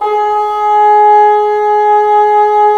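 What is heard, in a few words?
wind bassoon; LA Composer vol. 3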